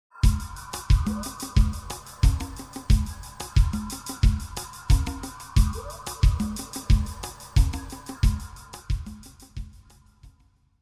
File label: Jungle epic